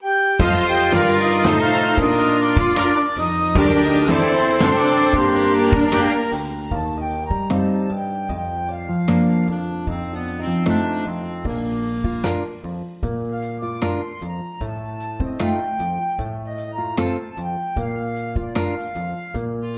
mixed ensemble

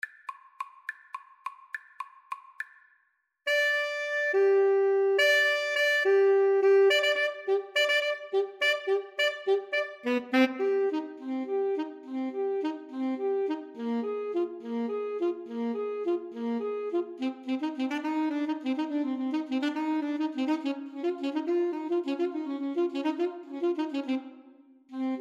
clarinet saxophone duet

